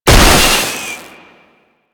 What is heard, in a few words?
Purification Weakpoint Destroy; Warframe; Nineteeen Ninety Nine; Infested Survival